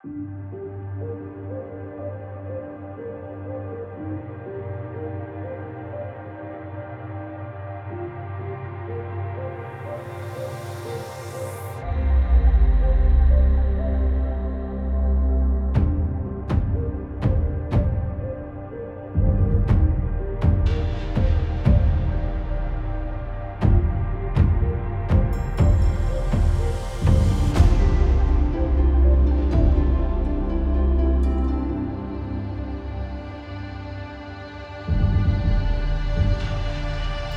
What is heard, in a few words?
intro opening